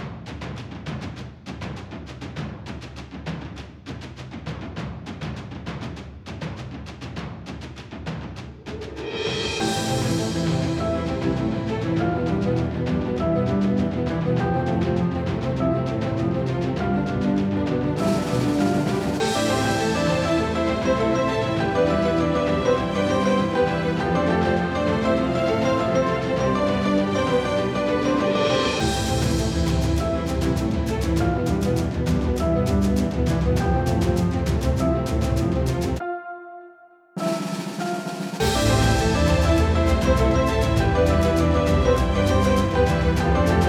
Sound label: Cinematic BPM 100